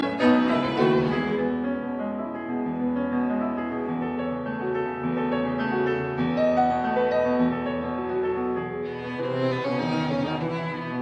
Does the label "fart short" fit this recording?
No